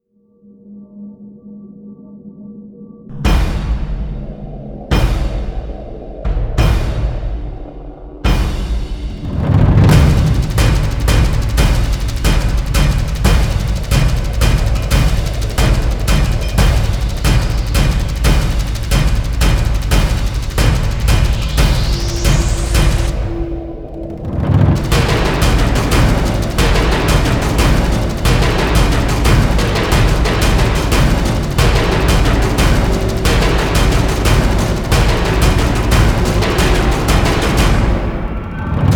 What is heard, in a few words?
Drums Only Version